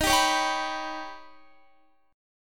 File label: Eb Augmented 7th Eb, Guitar; strummed; x,18,19,18,19,19; 4F,4A,5C,5G,5C,6E